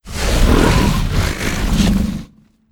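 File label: Hydra voice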